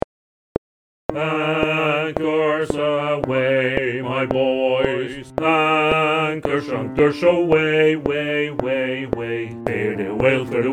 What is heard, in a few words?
Bass 2 click